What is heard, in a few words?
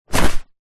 jacket safety thick fluro hi vis drop floor; zapsplat; foley